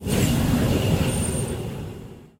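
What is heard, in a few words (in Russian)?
гулкий непрерывный шум над головой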